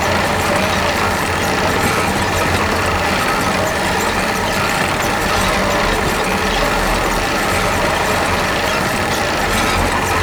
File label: Bucket Wheels Loop